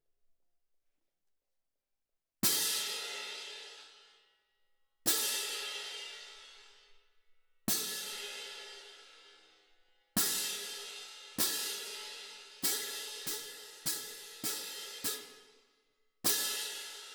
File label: Cymb Close